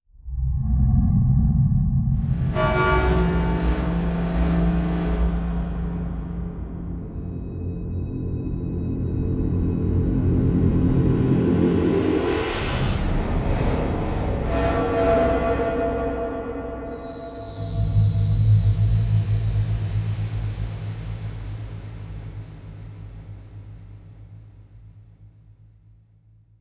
Imaging Electronic Whoosh Digital Low Frequency Reverse; Wash; Production Element Title Imaging